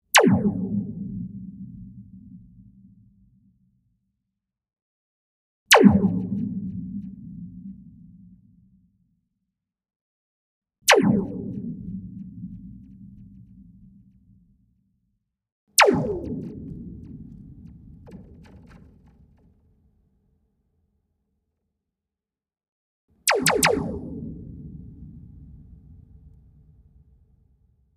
Slinky Laser, Multiple ray gun blasts. Zap, Ray Gun Web Efx; ray guns